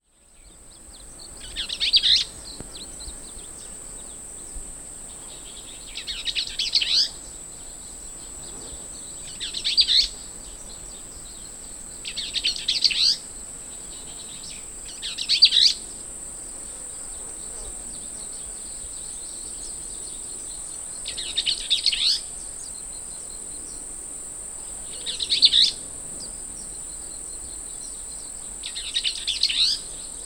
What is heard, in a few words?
Bell's vireo